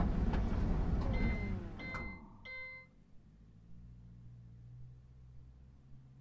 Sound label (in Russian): sushylka signal okonchaniia